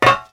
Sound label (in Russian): zvonkij ritmichnij udar po dnu metallicheskoj kastryuli